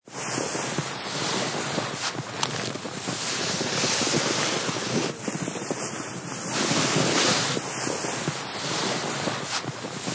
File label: Draggin a sled through snow; Dragging (Sound Effects:Objects)